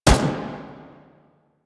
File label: Turret First Shot; APC; Warframe; Lasrian